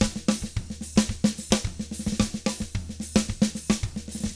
SMBA, 110 SAMBA, Drums

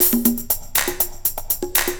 120 PERC

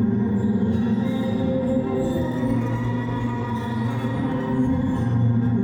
Tonal Re Draw, sparse soundscape

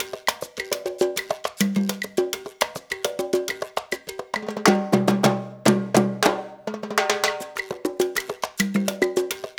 LOOP, Percussion; InVision Interactive; Percussion Slam; SULTAN

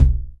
909 MIDTOM

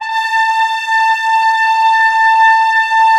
ORC A4 FF, Orchestra; Sound On Sound - Peter Siedleczek's Orchestra

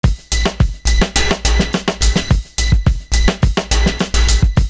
SUSPENS BEAT; Univers Sons

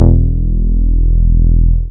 BAS MINI, Mini-Moog

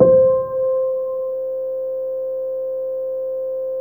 Piana C3, Pianos; Fairlight CMI IIe

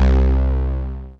505, String Machines

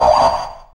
FX Dance

